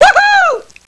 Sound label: "woohoo"; whooo hooo